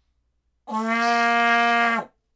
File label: vuvuzela